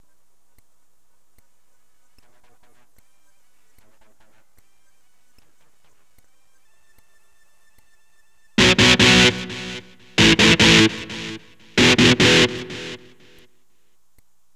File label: E GTR POWER CHORD